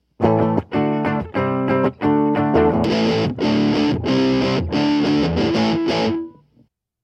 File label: Low Gain; Zero Demo